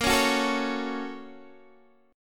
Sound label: A sharp Minor Major 7th Sharp 5th, down, Guitar, DADGAD